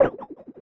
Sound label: Comic Jerk; free game sound effects library